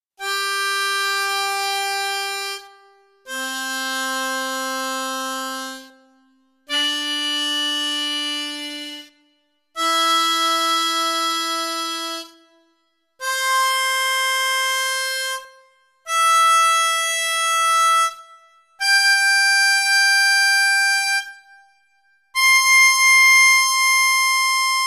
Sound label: Tongue, harmonica